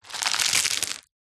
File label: food egg carton box squash eggs crack break; zapsplat